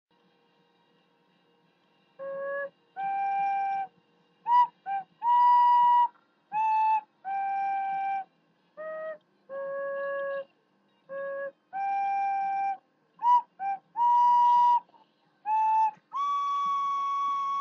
Amazing Grace flute

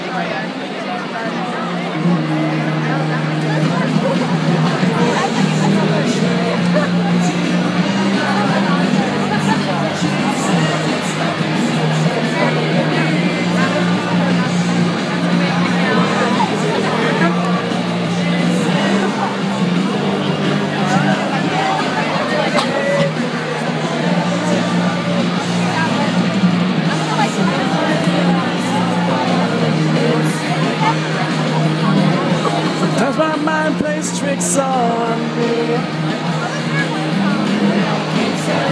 a basketcase of a crowd; at house of blues orlando

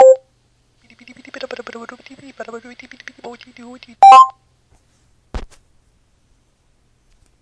sound effect made by google mobile app on ipad

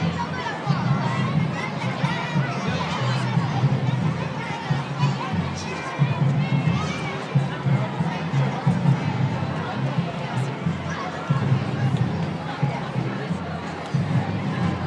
magical lantern procession

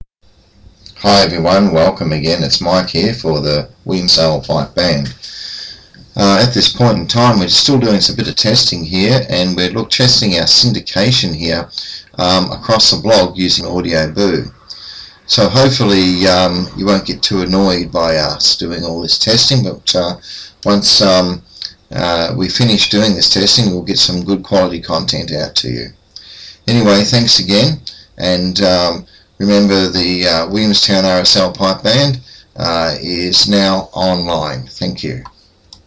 the pipe band is testing

